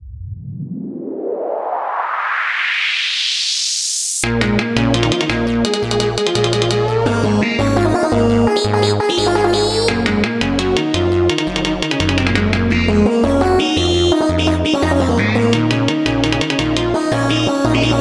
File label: energetic